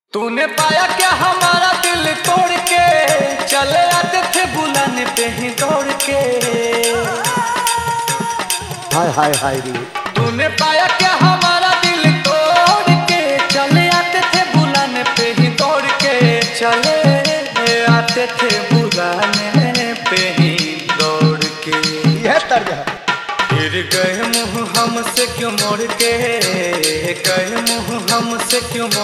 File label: Bhojpuri Dj Remix Songs Jhan Jhan Bass Mix